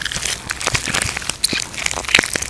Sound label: bomb run; Boss Megalodon